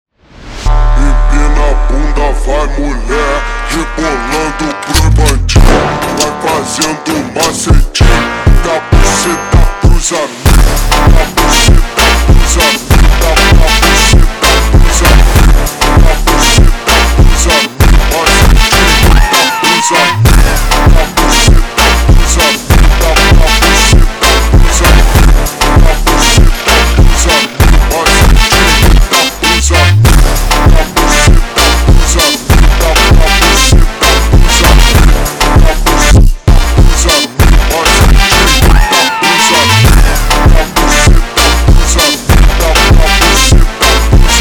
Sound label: Funk, slowed